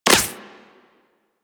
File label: Laser Impact Flesh; Warframe; Weapons